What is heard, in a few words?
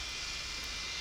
Background Noise